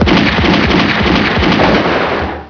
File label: gunshots